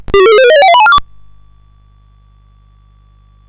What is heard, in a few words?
sid CSG8580R5; Frogger II-Three Deep